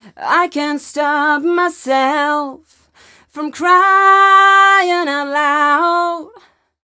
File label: f mel d, fem Vocals; Mutekki Media; Diamond Vocals 2